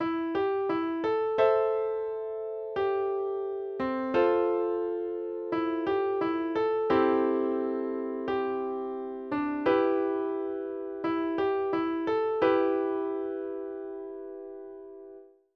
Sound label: instrumental